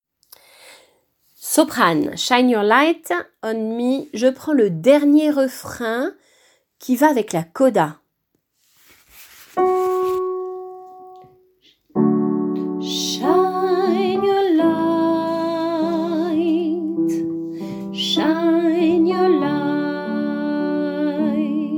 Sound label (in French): dernier refrain soprano